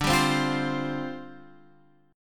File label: G 7th Suspended 4th D